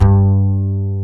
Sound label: Acoustic Bass